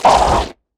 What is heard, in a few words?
damage; Barotrauma; Crawlerbroodmother